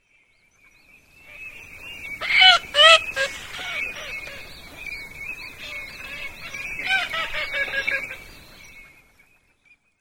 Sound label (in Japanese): kogamo, tendou, nakigoe; コガモ; メス; 山形県天童